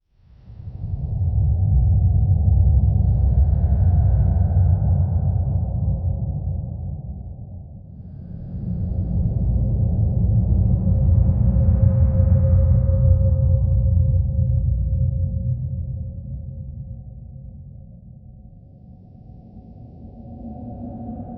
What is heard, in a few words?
ambient scary sound; exploration